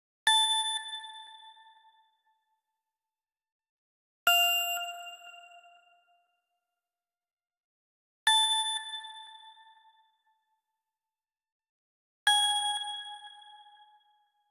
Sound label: Bell; Level 2.1.1 Bell; Krimson; Xbox Series X/S